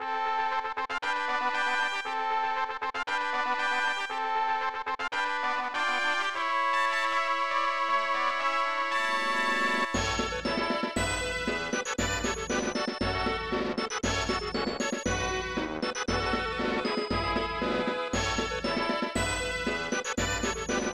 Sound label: Victory March; Genjuu Ryodan; SNES